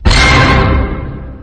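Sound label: orchestra hit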